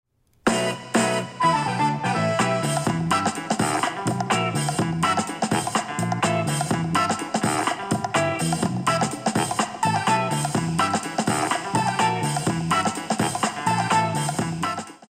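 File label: live pop mode, 現場/流行模式, 2.1聲道喇叭; BenQ 2.1聲道喇叭; 螢幕喇叭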